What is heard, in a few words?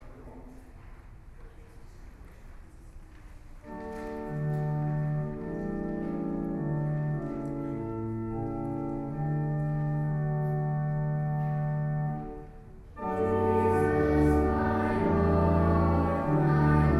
choir organ; 1st communicants choir